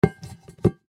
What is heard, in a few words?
Pot Set Down; Pot Stainless Steel 3 Quarts Set Down Upside Down Wood Surface; Pots And Pans; Household; Blastwave